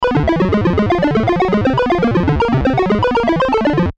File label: Video Game Melody Low Random; Video Games; Blastwave